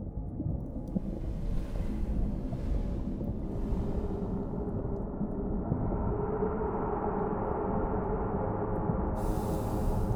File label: Fire Caves Ambience